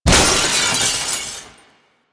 glass window break; Nineteen Ninety Nine

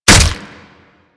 Barrage Hit Flesh; Warframe; Warframes

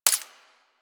Bladed Pistol Mech; Warframe; Tenno

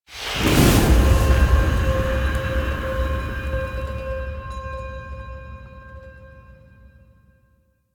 Cabin Enter Stinger; Zariman

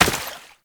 Step Water, Footsteps

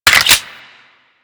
Lever Action Rifle Reload A; Grineer

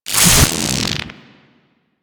Infested Arc Rifle Fire Stop A; Warframe; Weapons

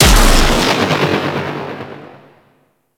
Target Dissolve Explosion; Warframe; Librarian

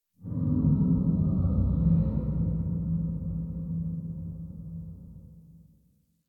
Exterior Distant Calls Scared; Ambience; Duviri; Warframe

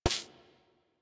Laser Impact Generic; Surface

